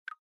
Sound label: Recording screen, ui; Infinix Hot 12 Play